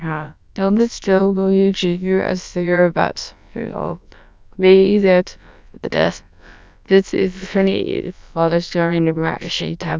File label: squeaky; Mel-FullSubNet